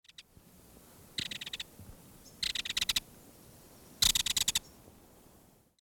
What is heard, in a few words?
Kingfisher, bird songs